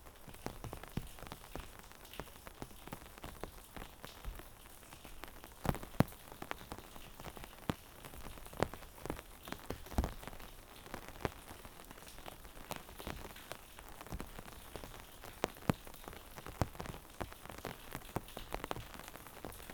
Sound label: rain umbrella; 99Sounds; Rain And Thunder